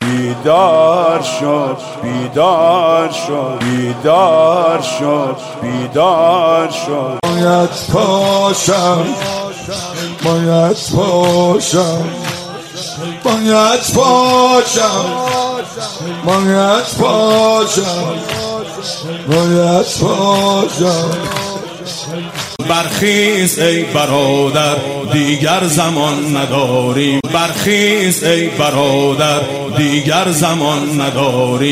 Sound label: alarm; بیدار شو کنکوری; konkori